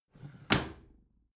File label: bathroom drawer close regular